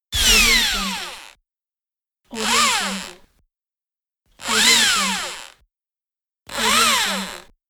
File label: drill idle